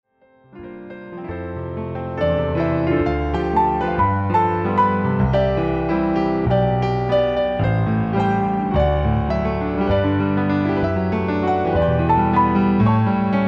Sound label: MEDLEY